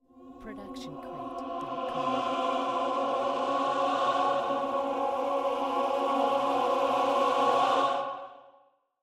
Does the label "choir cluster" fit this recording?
Yes